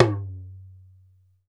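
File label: Talking Drum down; Drums&Percussion; MFX; E-MU Producer Series Vol. 7 – Old World Instruments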